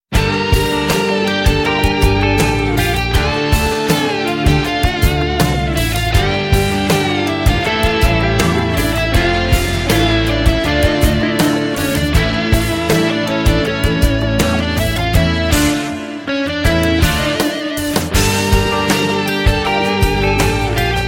anatolian rock

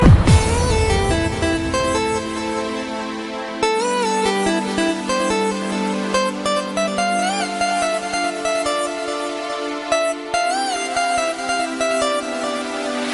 Smooth Ringtone

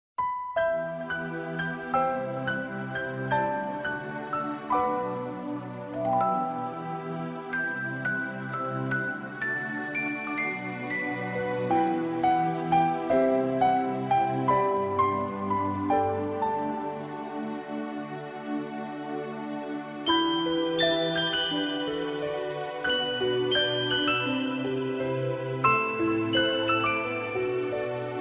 Sad Song

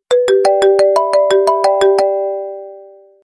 Alcatel; Alcatel 255

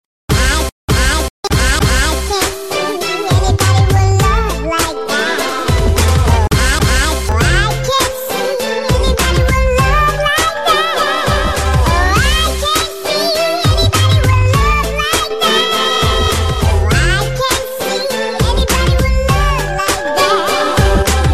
Baby Rapper

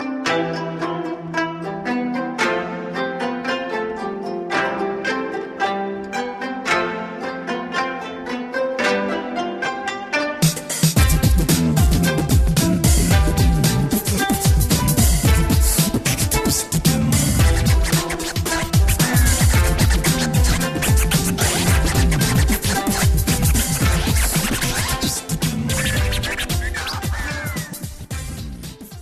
Canon In D

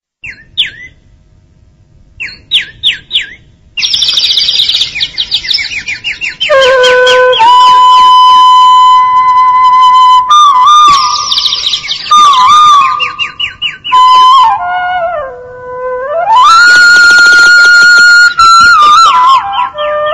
Humming Of Birds

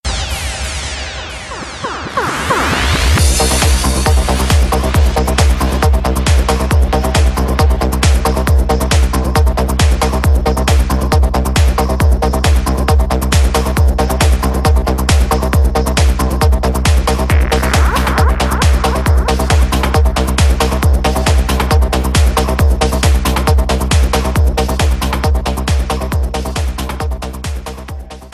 Psy Trance

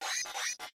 Collision

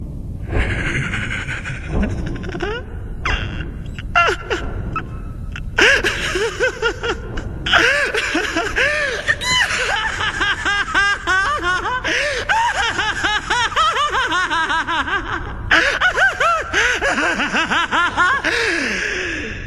Laugh Dubbed

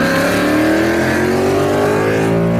Ford Mustang GT

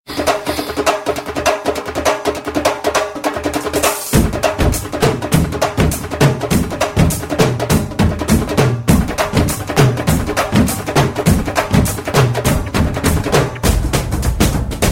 Drums Effect

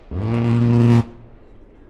A-10 Warthog GAU-8

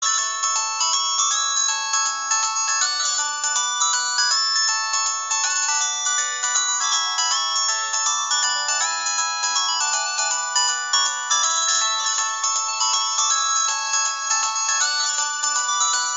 Bach minuet

